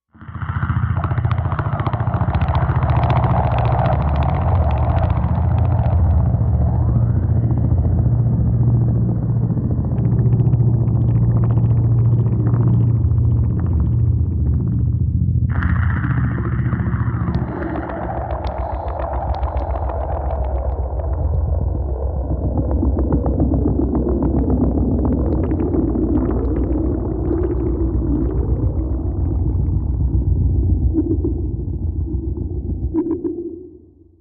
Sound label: Night Shift Low Static E, sci-fi ambience